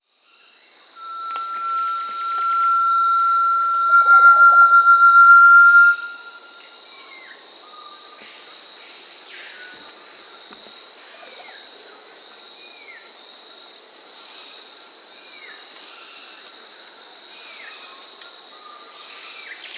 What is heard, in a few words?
Brazilian Tinamou (Crypturellus strigulosus); Peru; S. America